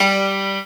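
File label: grand piano G2; Star Trek JR Audio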